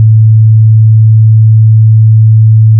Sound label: sine 110hz